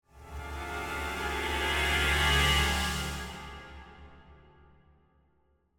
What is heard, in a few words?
Symphonic Hit Delay The Danger - Short Pad; Hollywood Edge; sound designer's toolkit v2